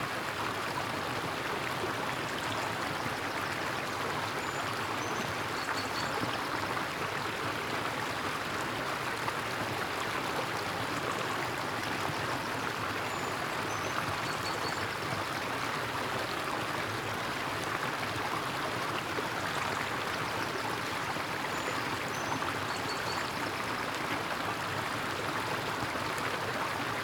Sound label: CAR STREAM EVE; The 39 Steps